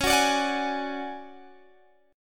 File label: D Major Sharp 11th C sharp, chord